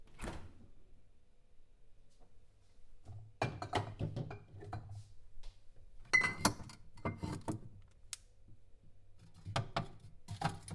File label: Opening Fridge